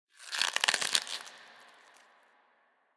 hatch, alien

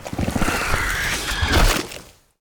burst

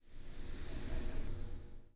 space wind

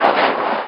body scrape; GS13; yogstation

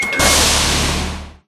Airlock Open, machines

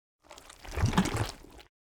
squish

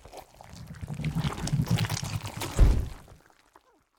escape

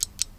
clock tick

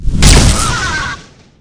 staff chaos, magic

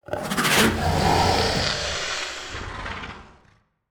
growl, alien voice

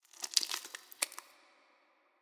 weeds, alien

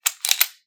gun slide lock

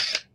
sheath

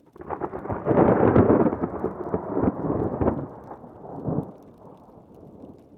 thunder; VOREStation

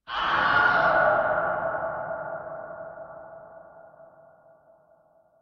wilhelm; hyperstation

